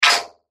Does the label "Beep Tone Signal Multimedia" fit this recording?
Yes